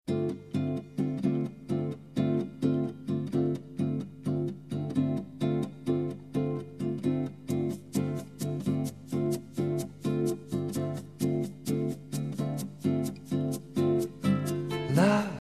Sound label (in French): Rythme Regulier